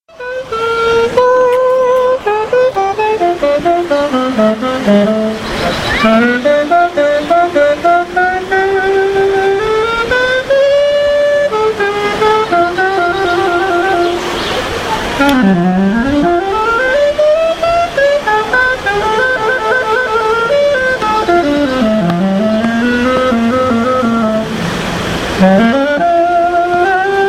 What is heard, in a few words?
sax; quimper